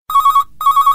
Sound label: Mosquito Ring